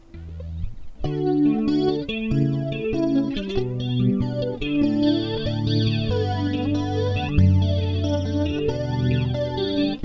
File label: flange